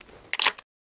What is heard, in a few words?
load, Spas-12 weapons; Spas-12 Reanimation